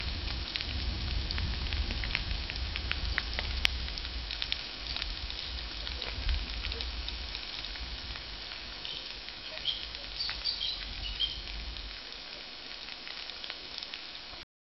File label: silence broken by faint, jungle